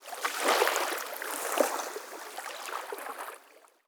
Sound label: river lap; anubis